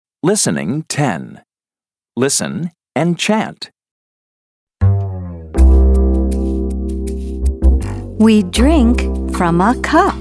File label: Chant; Family And Friends; Family 3